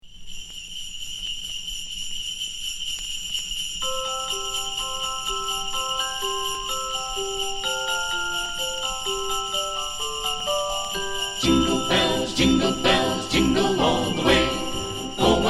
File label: Jingle Bells; Special Ed Xmas